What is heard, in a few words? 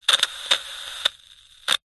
radio receiver or other receiver click hiss crackle no signal short